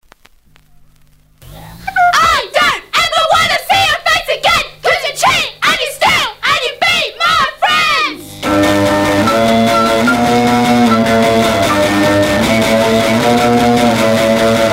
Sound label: Punk Rockest